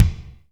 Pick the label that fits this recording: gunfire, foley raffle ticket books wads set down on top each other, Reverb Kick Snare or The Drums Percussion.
Reverb Kick Snare